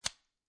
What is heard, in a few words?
Swiss Army Knife Open Blade, Foley, Swiss Army Knife, Opening Knife Blade